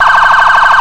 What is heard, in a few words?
priority, sirens